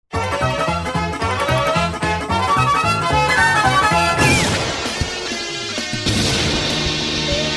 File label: stage clear